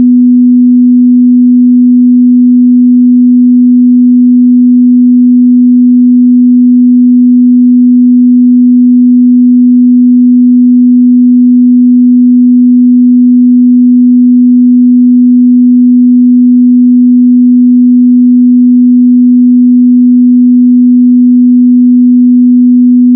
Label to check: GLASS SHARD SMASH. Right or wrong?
Wrong